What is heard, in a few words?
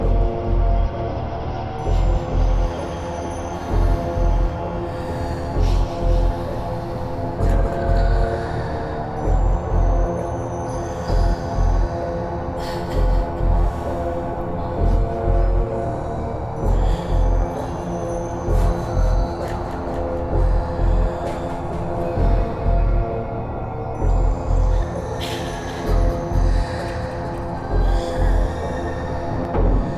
Loop without Perc; children of morta; Procedural Events